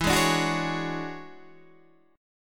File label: E 13th, Guitar, Standard; strummed; JGuitar